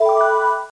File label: ROLLOVER; Let's Play with Sakura!